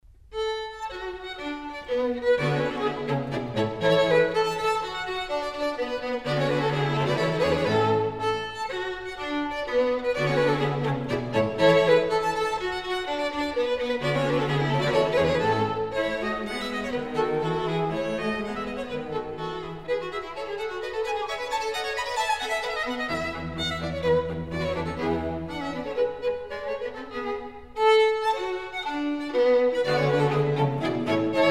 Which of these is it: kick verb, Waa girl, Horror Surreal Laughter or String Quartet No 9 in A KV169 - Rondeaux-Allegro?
String Quartet No 9 in A KV169 - Rondeaux-Allegro